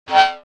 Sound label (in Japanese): buranko